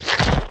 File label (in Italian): Morso Grande